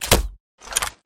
potato cannon reload, guns

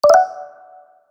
Popup Bet

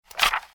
page Turn; abcs; letter m

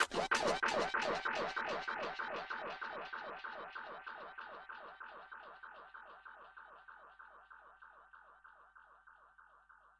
FX, viral hiphop

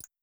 Sound Change Tabs

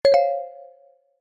negative

